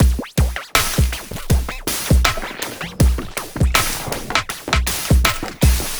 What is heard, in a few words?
Space Kit B; Ударные и барабаны (Downbeat); 80 BPM; Downtempo; Drums; даунбит